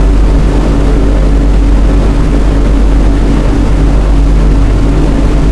blenheim cockpit, engines; War Thunder

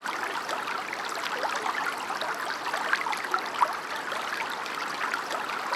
ship engines; World Of Warships

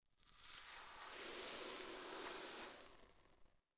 Desert Sand, Ambient; Civ4

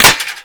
impact metal; weapons; Modern Warfare

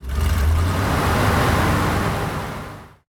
engines; World of Tanks

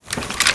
toss weapon; Call of Duty Modern Warfare

